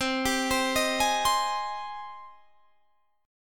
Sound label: Ab Major 7th, C